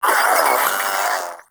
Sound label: Proto Infested Maggot Awake Vocals; Warframe